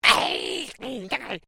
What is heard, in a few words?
Created Creature Small Call, Alien Sound Effects; Created creatures, vocalisations and pretend speech